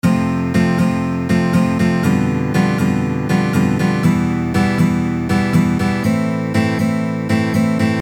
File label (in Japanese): onコード（ルート保持）; oudo progressions 11patterns